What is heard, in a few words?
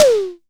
Disco Tom - Med; Tama TS-206